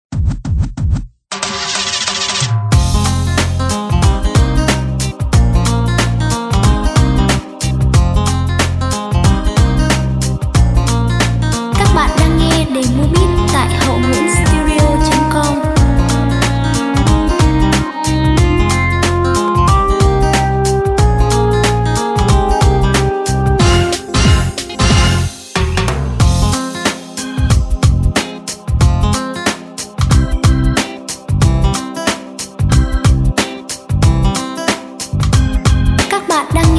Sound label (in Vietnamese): beat